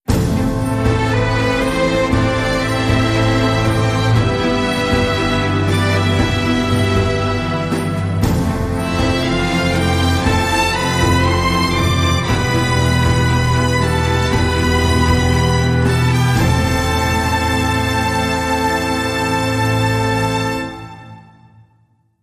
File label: Epic Action